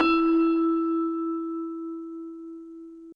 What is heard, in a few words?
Bell E4